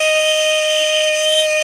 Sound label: ney mi